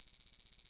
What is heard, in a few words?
canary long, sound icons